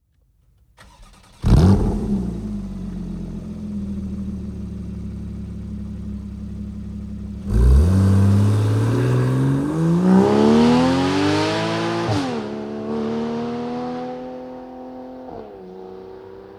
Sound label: GT Exhaust start and full power valve closed